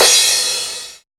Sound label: dark open hi hat sound f key